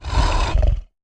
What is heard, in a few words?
Monster growls and snarls. Creature, Monster Growls, Monster Eerie Sound